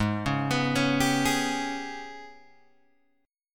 G sharp Minor Major 7th Double Flat 5th, Guitar Open G; 6,6,9,6,8,6